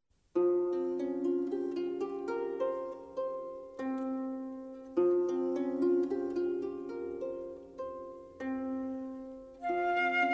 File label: Asian Delight Zen Instrumental Music